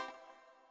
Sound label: Collect Honeycomb; Banjo Tooie